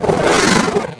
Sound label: attack light; gurrek